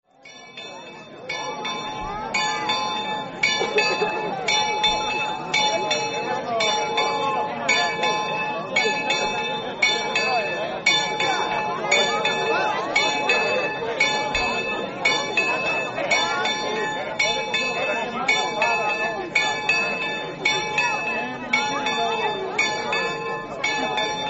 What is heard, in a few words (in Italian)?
campane; monte ragogna